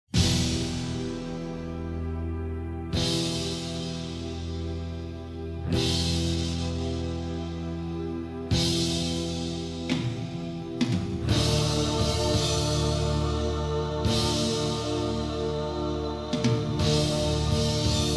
metal trance

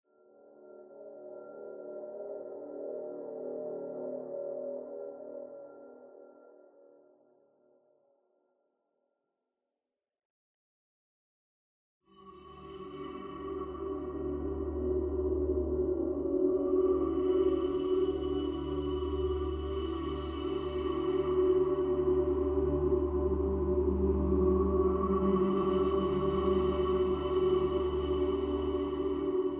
ambient

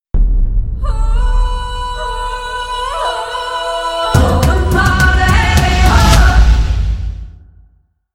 tribal yell with drums

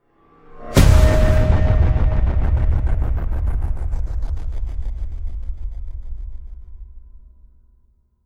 evil slams contagion

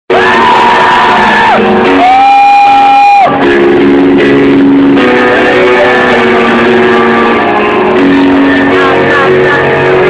piano solo

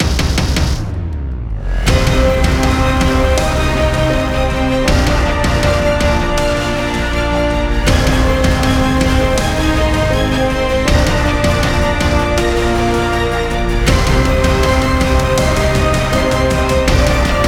no choir

